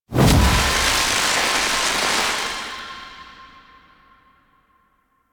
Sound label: Queens Chamber Queen Scepter Blast; Warframe; The War Within